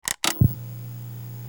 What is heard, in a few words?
deathcam review start; Counter Strike Model Pack; CS:GO